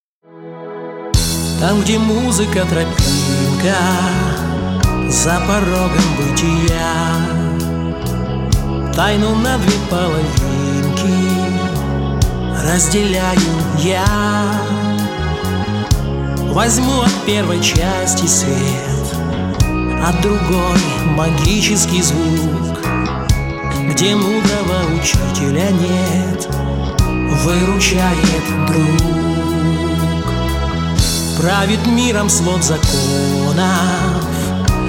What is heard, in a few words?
rock